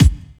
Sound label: bass drum, 145 BPM, Drum & Bass; Ueberschall; Drum & Bass Flash Trackz